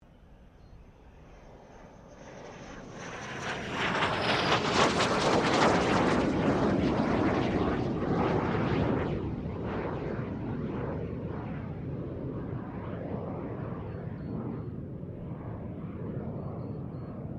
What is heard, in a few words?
AIRCRAFT JET PROVOST T2 PASS